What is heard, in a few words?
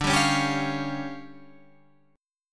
D Minor Major 7th Double Flat 5th, D